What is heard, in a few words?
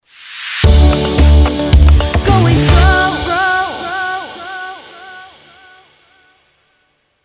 Stinger